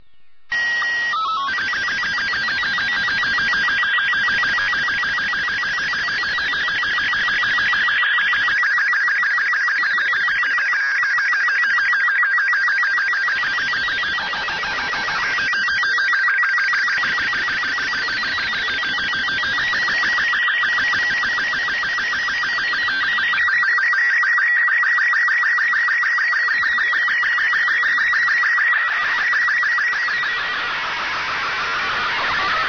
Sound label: ambient; Portal 2; Source Engine; video games